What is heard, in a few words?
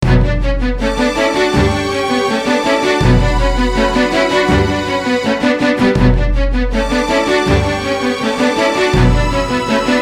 Low Tension; gensosangokushi ii original sound track